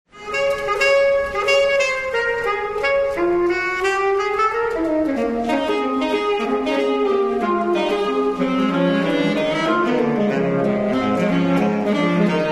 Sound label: sax quartet swing; kyiv